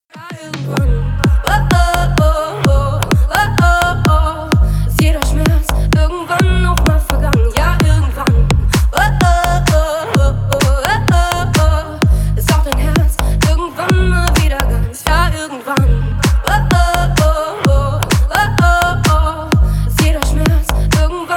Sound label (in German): dance mix rington